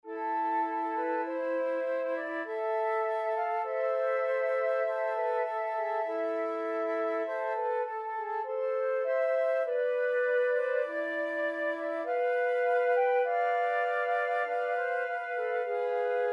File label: A Flute Trio